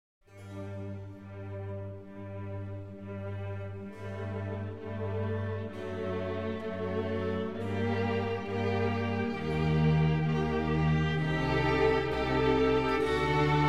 Concerto Grosso in G Minor Adagio e Spiccato - Allegro